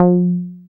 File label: Bass SH-101